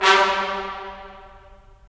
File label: BRS, Tps Stacc; Roland - LA Composer vol. 4